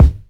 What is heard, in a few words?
nice kick drum sample c sharp key; Bitdepth 24; kick drum samples